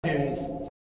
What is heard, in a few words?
loop